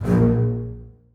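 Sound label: basses staccato a1; Sonatina Symphonic Orchestra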